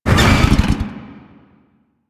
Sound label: Motorcycle Stop; Vehicles; Warframe; Motorcycle Ninety Nine